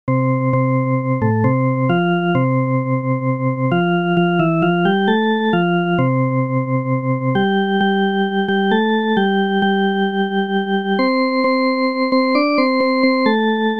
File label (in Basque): melodia